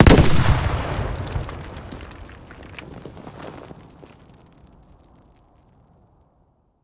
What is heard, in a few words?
close, explosions; gmod; lvs framework